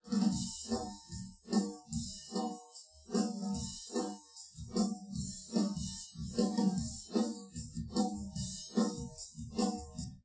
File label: drums; SiSEC10; underdetermined